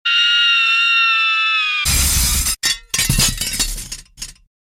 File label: Girl Scream Falling